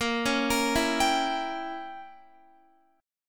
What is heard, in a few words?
A sharp Diminished 7th, Guitar; A#dim7; arpeggiated; x,13,13,15,16,15